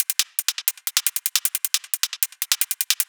Rhythmic Loop 155 BPM, raw hardstyle kick; raw hardstyle kick pack 1

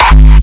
Kick, Raw-Hardstyle; raw hardstyle kick pack 1

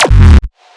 Strange Kick, raw hardstyle kick; raw hardstyle kick pack 1

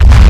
Kick Rumble, Raw-Hardstyle; raw hardstyle kick pack 1

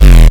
Kick Tail, Raw-Hardstyle; raw hardstyle kick pack 1

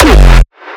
Raw Kick; The FREE Raw-Hardstyle Kick Pack Vol.1

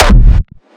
Gated Kick, raw hardstyle kick; raw hardstyle kick pack 1

